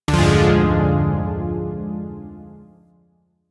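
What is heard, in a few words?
Shawzin Minor Chord B; Zariman Shawzin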